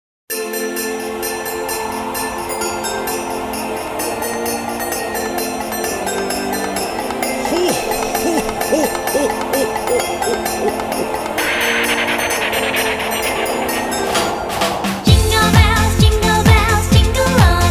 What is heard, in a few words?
jingle bells